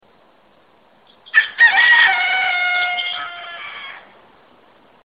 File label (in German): der Hahn